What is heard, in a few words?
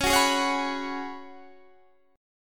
Db 7th Suspended 4th Db, guitar